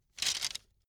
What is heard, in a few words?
bamboo sticks; objekte; holz